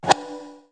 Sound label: sub select; Bombardier